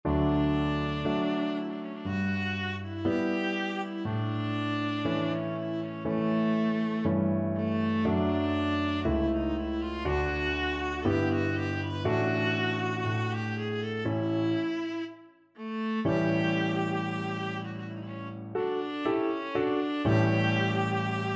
viola

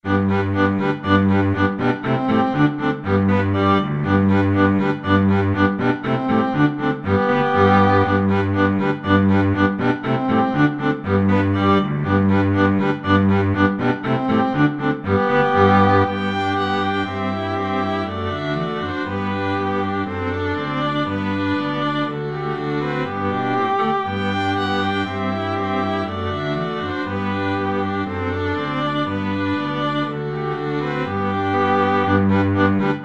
ecossaise, string quartet

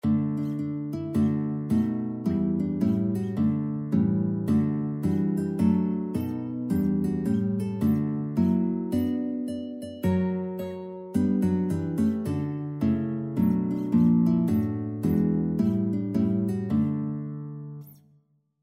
itsy bitsy spider guitar

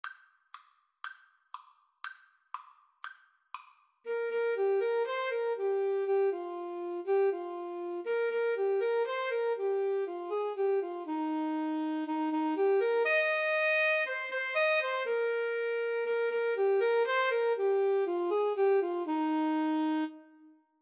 camptown races 2 A SAX

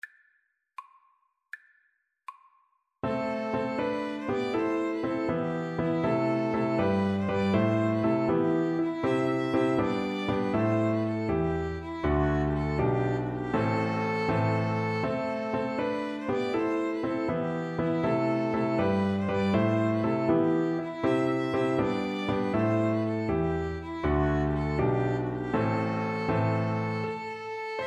violin

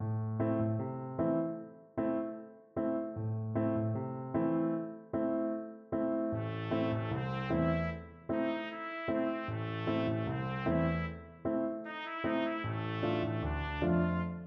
reggae, trumpet; reggae masta

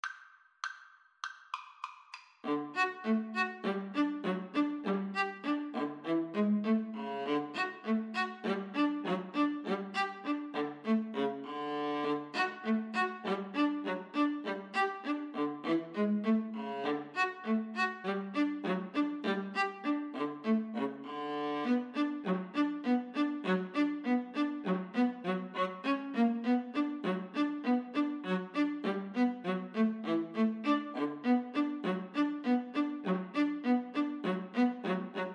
Viola Duet

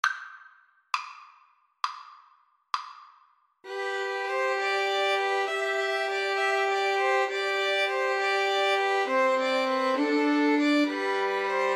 pastorale, violin trio

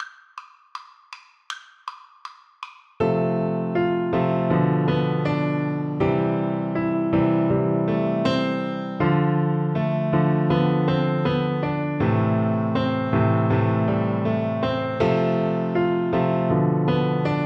borodin polovtsian, Bassoon

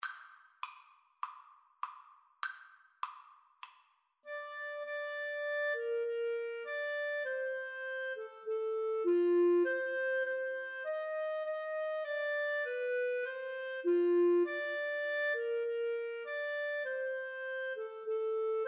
beautiful for spacious, Flute-Clarinet Duet

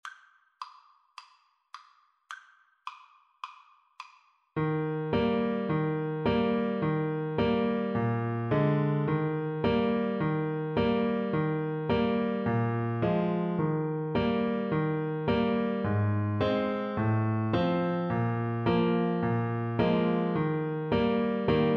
yankee doodle, Tuba

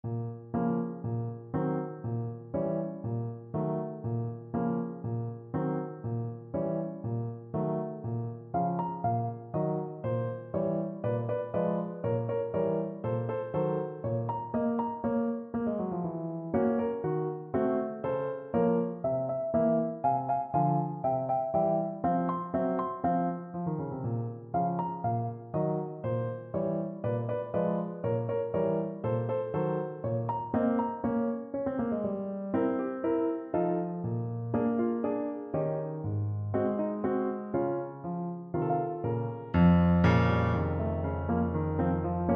sugar plum, Trumpet; tchaikovksy

